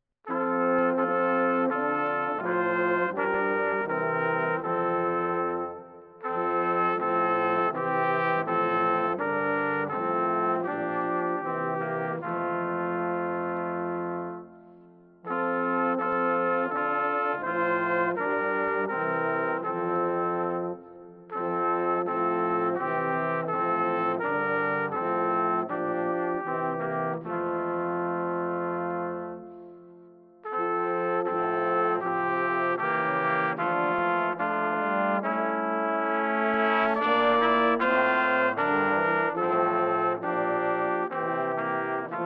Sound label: chorale